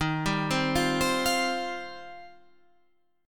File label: D sharp 6th Add 9th D sharp; arpeggiated; D#6add9; 11,10,10,10,13,13; JGuitar